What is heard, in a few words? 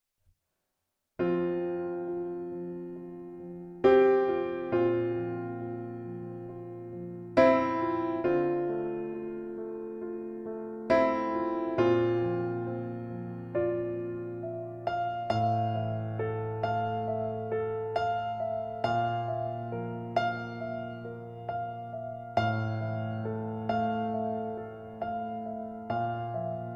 piano; demo de piano